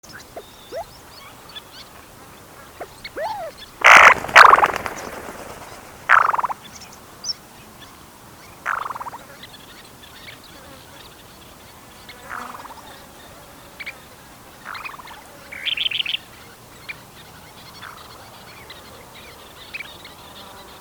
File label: Black bellied Sandgrouse Pterocles orientalis arenarius